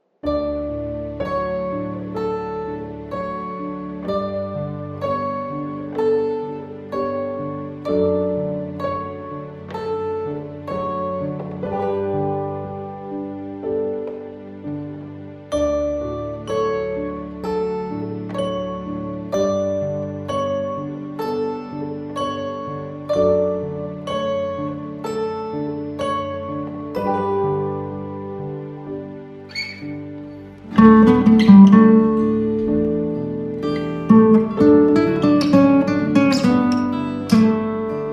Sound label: Oboe